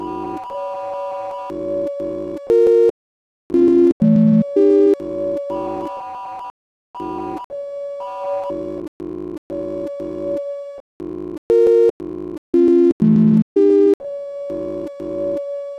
sinewave Assignment

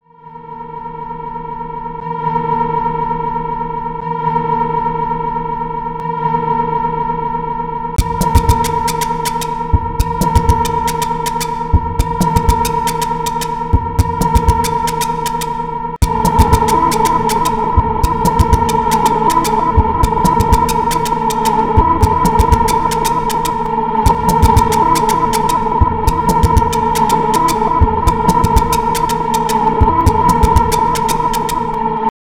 Sine wave

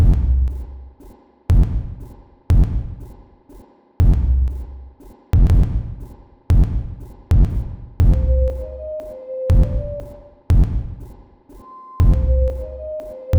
sinewave

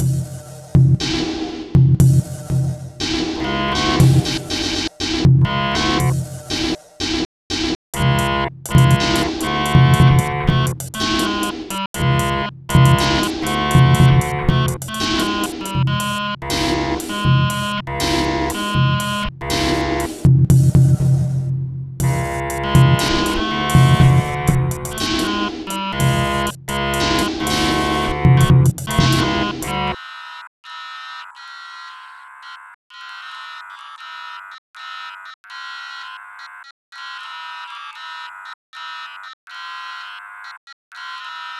song

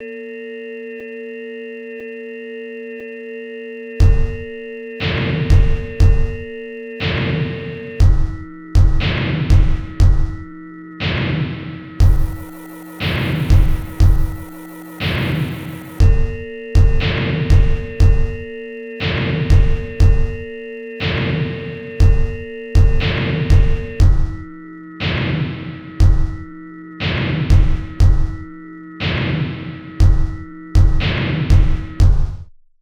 Sin Wave

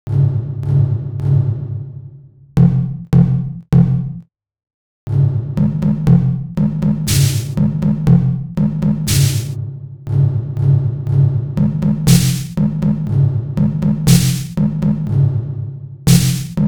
Song

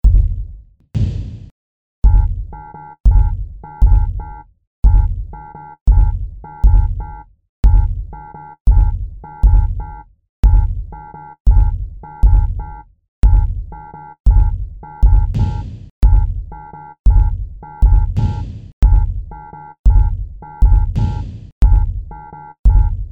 sinewave

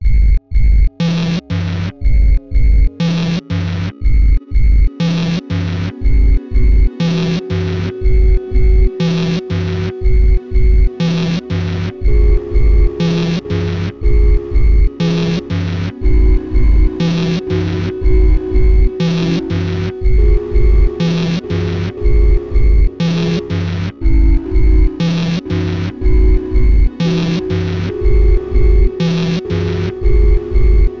Sine Wave

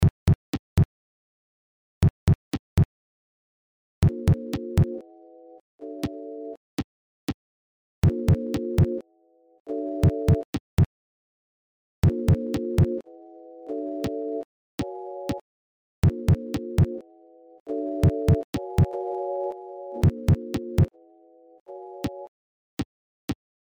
sine wave

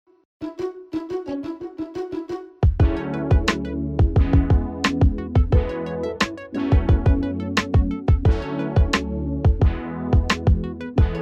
MIDI

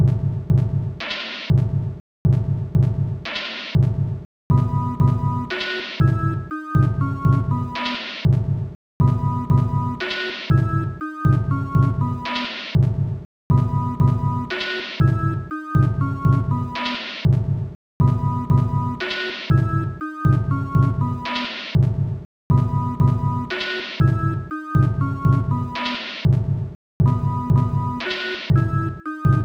Sinewave

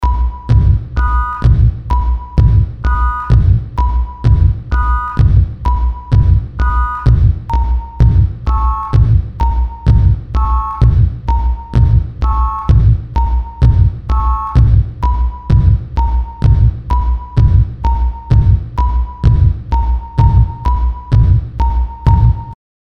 Sinewave

